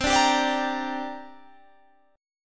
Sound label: C 7th Suspended 2nd C down Guitar Standard; C7sus2 strummed